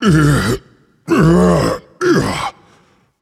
grunt lift; smite; khepri

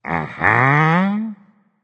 agree berserk; cuchulainn

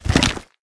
lobby landing; smite; geb

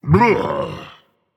Ugh, Dragon; fafnir

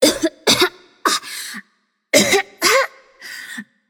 nv clear throat; smite; voicepack; morgan le fay

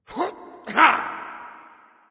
grunt; smite; guan yu

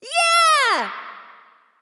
nv yay; smite; awilix

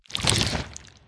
slither short; smite; scylla

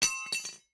weapon ground; Smite; Sobek

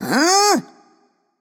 nv confused; cupid

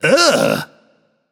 nv ugh; cupid

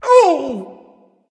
ouch; smite; odin

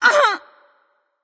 grunt hit light; smite; voicepack; hel

